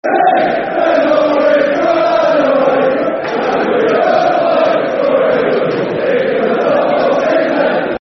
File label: Football Chants; Hark Now Hear The Ipswich Sing; fanchants; Ipswich Town Itfc